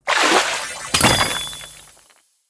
random wilds sequence wilds are added to the reels with koi animation; koi princess